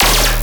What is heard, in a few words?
Bass Hit D#, Dubstep